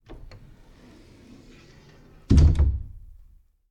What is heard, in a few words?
door close; Golden Crayon Sounds